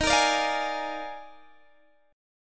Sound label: D sharp Major 7th Suspended 2nd Suspended 4th D sharp Guitar DADGAD, chord; x,18,20,19,20,18; chordshape